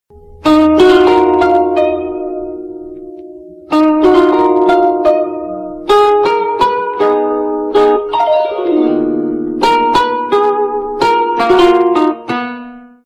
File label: koto